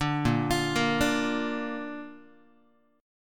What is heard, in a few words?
A sharp Major, Guitar; arpeggiated; JGuitar